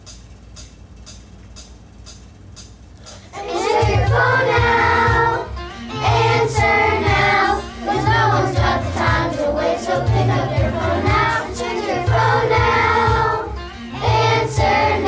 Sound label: ringtone